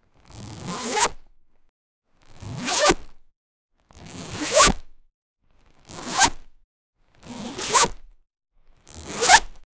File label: cloth